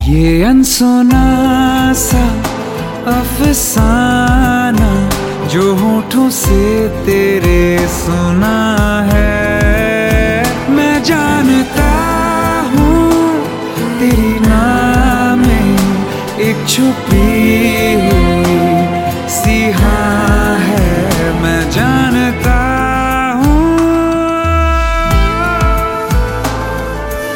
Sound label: Ringtone